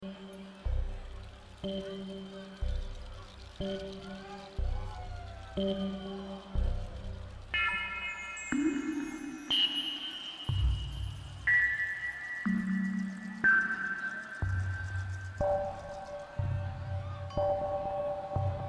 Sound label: Modular Improv